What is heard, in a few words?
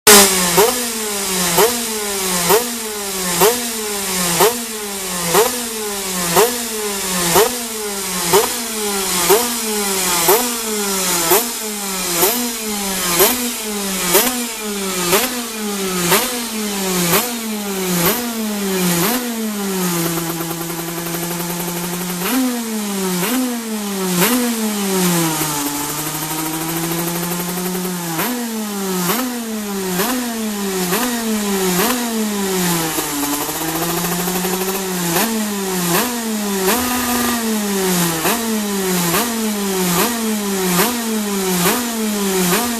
Motor